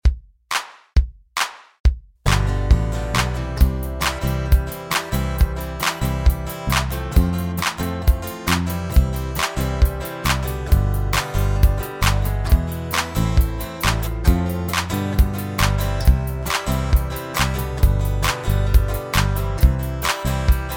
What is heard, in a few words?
i like to clap instrumental; music appreciation